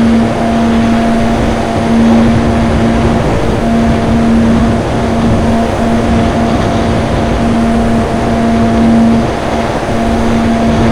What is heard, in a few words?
Aircrafts F-15 JFS; DCS